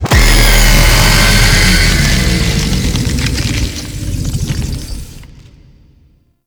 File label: Death; Sentient Condrix